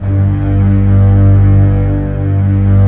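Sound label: orchestra strings G1; GRANDSTRG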